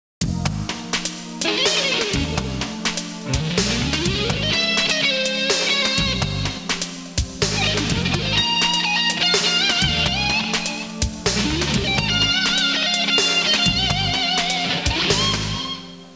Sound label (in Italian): suonerie musica jazz